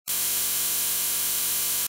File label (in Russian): gluk